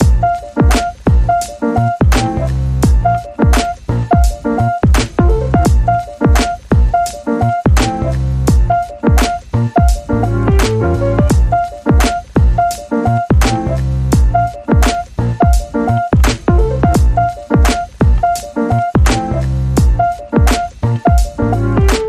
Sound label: Ultimate Lofi Rhythm